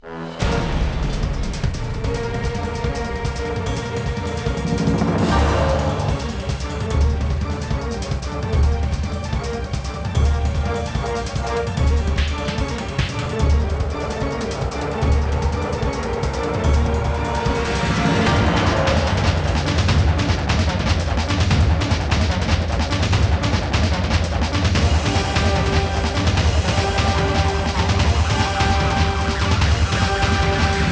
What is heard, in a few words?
Battle; Ninja Gaiden Dragon Sword